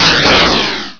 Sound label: ionize; Despair 1.6